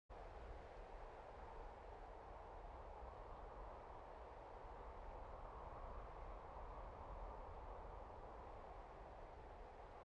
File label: ambient outpost; firewatch